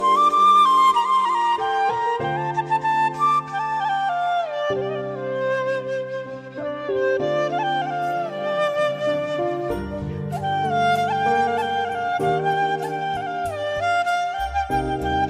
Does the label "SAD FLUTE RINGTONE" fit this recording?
Yes